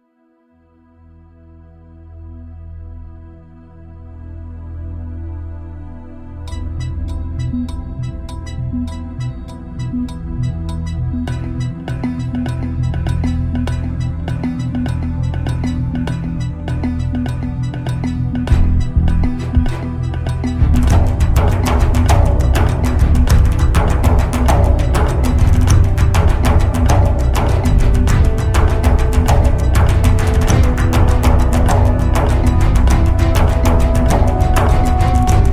Nature and the Machine (Instrumental)

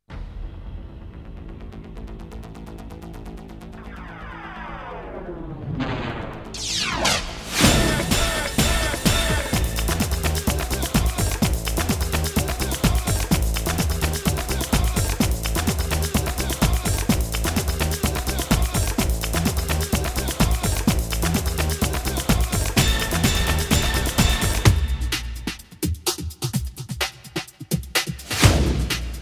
Title Music; Jung

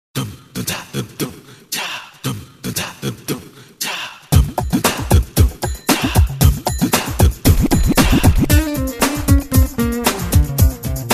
Dance Class (Instrumental)

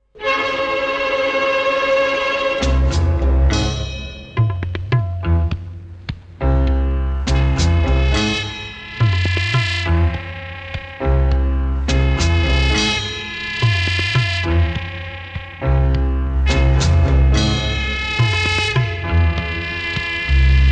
Theme (Instrumental)

